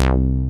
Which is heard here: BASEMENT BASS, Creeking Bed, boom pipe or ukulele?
BASEMENT BASS